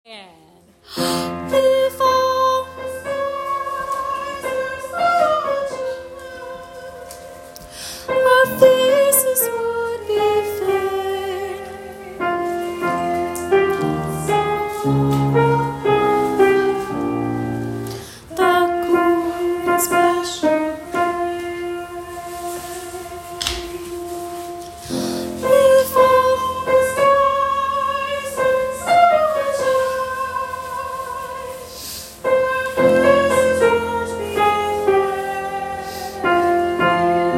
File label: Soprano 2